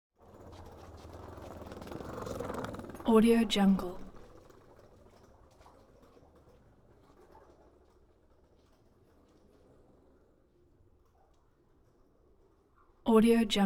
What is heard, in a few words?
suitcase rolls four wheeled ratchety